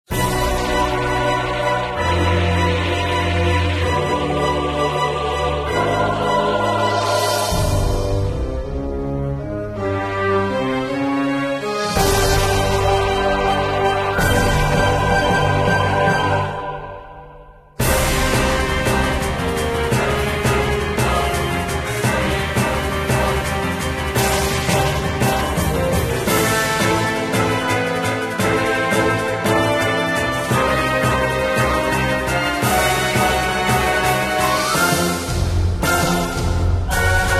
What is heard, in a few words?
Mythtic Roar; The Legend of Heroes Trails at Sunrise